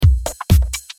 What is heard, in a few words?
Beat